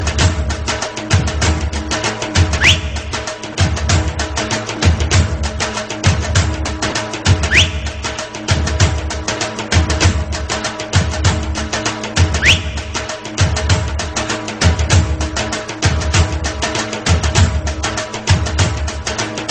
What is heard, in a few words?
Desi Tone